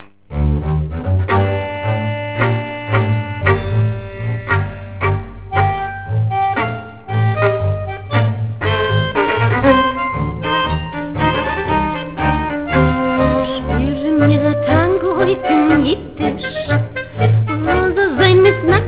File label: tango